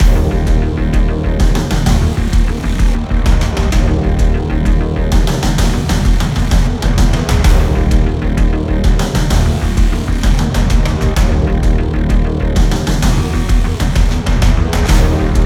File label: Action Flick Battlefield; Action Flick Music Pack